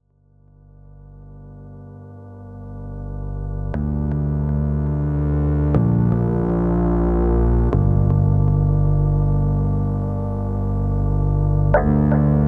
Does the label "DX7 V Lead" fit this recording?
Yes